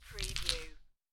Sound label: Dice Grab Collect Five Dice From Felt